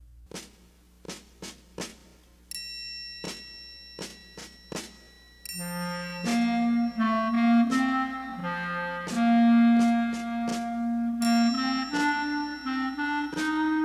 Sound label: clarinet caprice slower